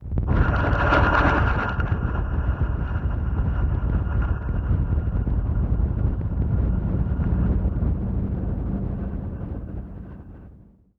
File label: Dark Fx